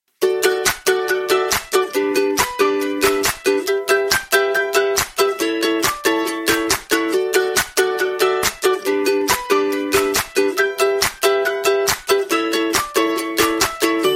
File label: Jingle